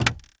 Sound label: Ford LTD glove box opens and closes, emer; glove boxes; tr sed glbox close